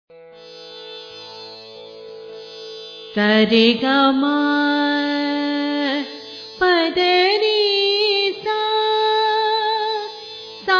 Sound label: ahir bhairav, raga